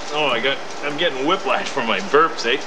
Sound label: Whiplash Burps